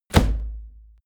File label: bodypercussion claps; whooshes rises; hits impacts